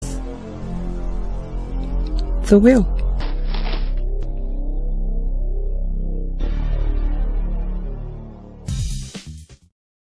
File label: Nigerian gospel music